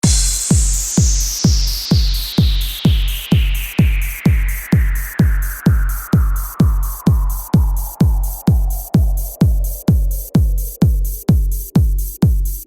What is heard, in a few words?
Trance Mix; Vinyl Scratch